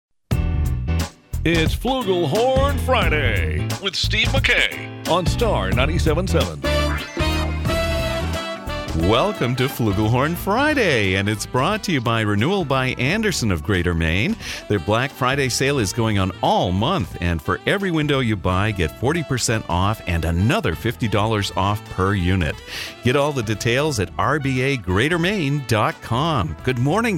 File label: Flugelhorn